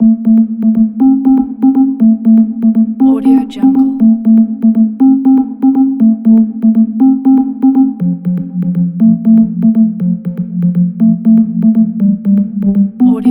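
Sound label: hypnotic underscore loops melody 120 bpm A minor; esmteam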